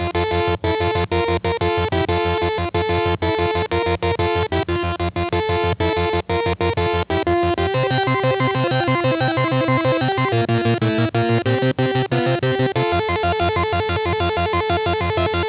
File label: speaker, pc; Turbo Outrun